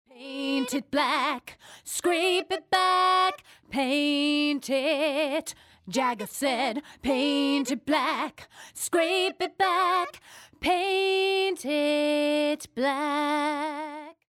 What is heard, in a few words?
Voc no HP; high pass filter